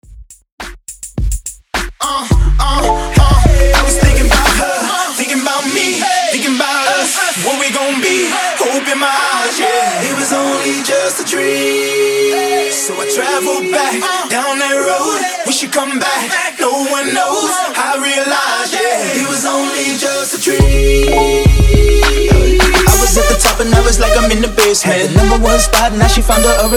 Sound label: Instrumental Acap 105 BPM